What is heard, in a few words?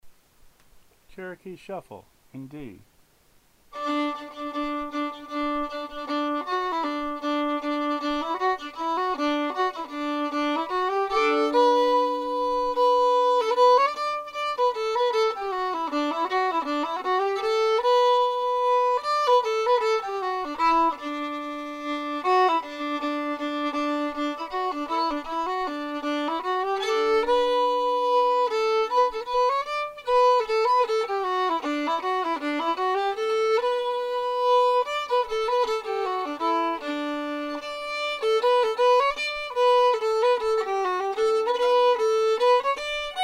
fiddle; festival tunes